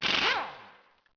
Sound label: air wrench